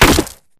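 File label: Bullet Light Ballistic Concrete impact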